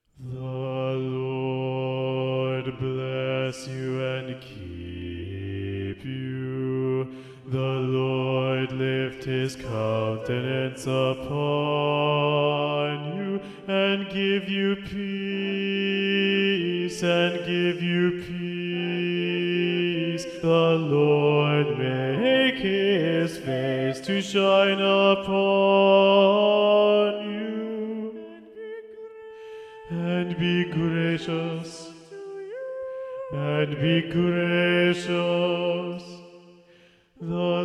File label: Bass Predominant; choirs